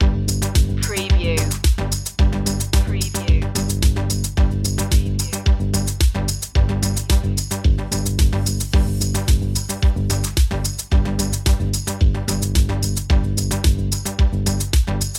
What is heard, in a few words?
corporate machine loop; media music now